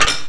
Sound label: SHOP OFF; PC World Komputer 1998 May; rrdemo